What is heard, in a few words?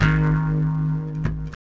Sticky Pluck; Zero-G; Soundclash Nu Dance Electro; Acidized Wavs; FX Atmospheres